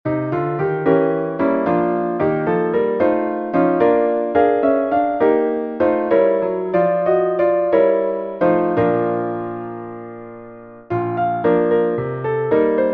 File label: piano pseudo rag b flat major; ragtime-like piano solos